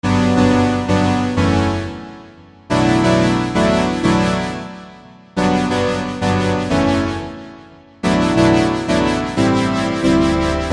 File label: brass organ with lfo